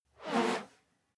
whoosh whistle slow steady; swooshes-swishes

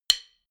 drumstick hit hard, percussion

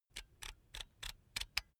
electric drill template adjust soft; soundpool

